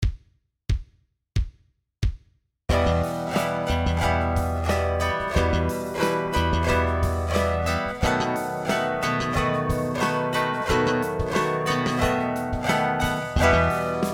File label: Band; Play along zum Spielbuch Band 1; Begleit-Musik